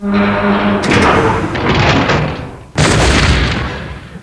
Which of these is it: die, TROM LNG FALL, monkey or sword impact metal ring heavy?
die